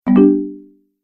Message Alert, beep

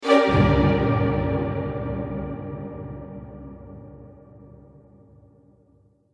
Orchestra Horror Sting; Stinger

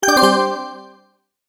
multimedia tone alert; Tones; Sound Design

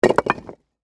Impact Rock Drop, Heavy, On, Rocks; Materials; BlastWave

